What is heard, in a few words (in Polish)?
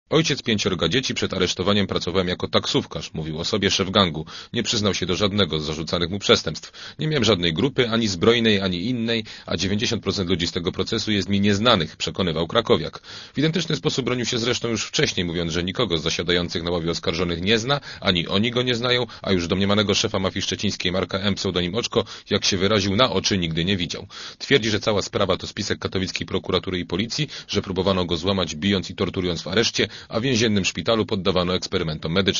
krakowiak; sad